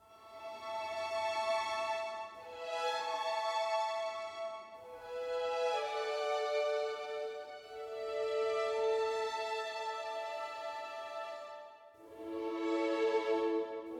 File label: World Music